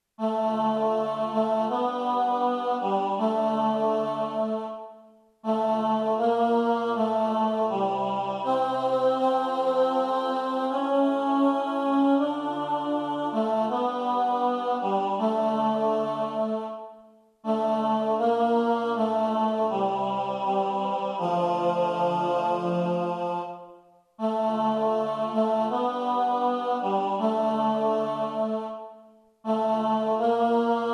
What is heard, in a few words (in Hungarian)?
Áldott légy Uram tenor